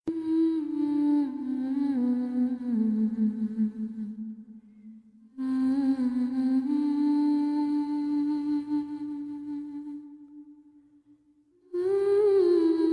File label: girl humming